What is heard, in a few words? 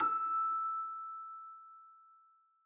Celesta Higher E